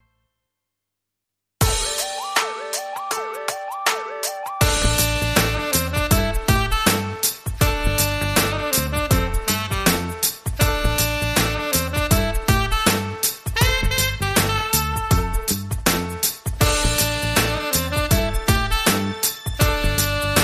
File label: Hip A Soul Saxophone